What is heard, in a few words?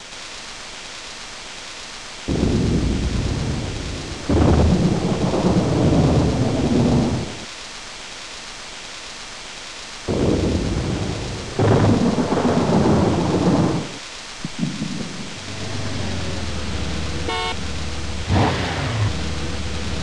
RAINY NIG